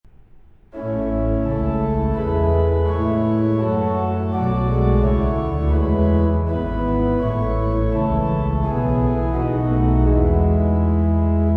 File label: lancaster, hymns organ